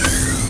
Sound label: SLIDE IN; V-Rally